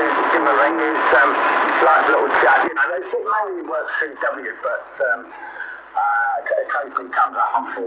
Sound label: 40M band